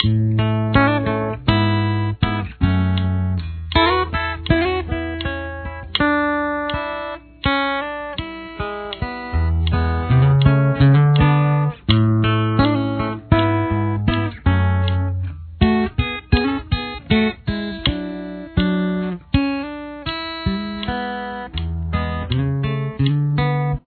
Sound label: verse guitar